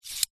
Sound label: industrial caliper metal slide fast